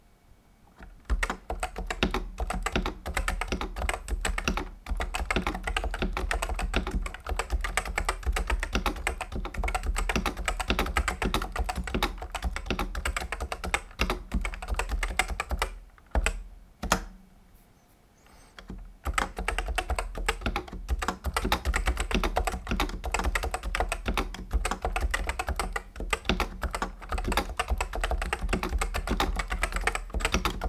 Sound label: Realforce PMK Borealis DSA